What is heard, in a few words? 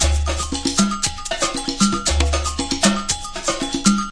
117 latin percussion